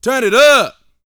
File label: VOX F, Vocal Planet R&B & Dance HIP-HOP; ILIO